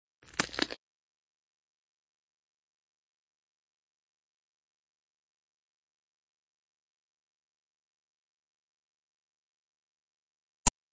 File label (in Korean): Fights, 싸움-전투; 헐리웃특수효과음